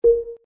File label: bonk; Plinko